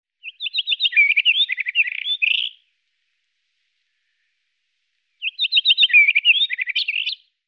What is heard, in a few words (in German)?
Saharasteinschmaetzer Oenanthe leucopyga; Vogelstimmen